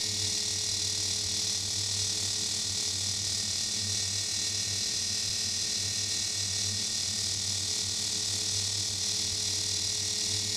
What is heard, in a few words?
ambience electric hum